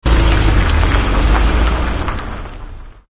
save; Ultimate Soccer Manager 98

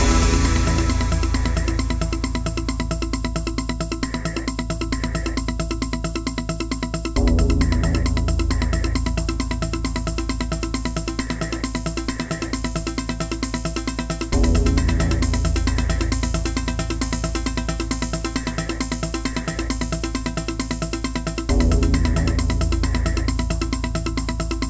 chase; Mirrors Edge

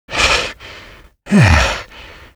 fish out of breath; Half-Life 2 Lost Coast

